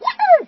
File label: pluck